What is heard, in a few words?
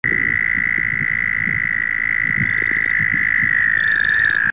bufo hemiophrys canadian toad